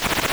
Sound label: Boss Bursting Fast; Zelda